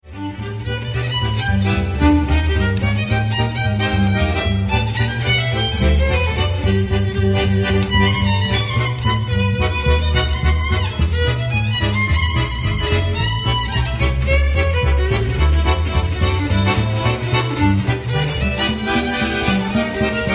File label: swing